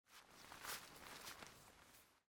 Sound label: Footsteps From Left; Age of Empires III; cinematics